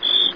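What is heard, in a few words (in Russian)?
сверчок одиночный резкий звонкий приглушенный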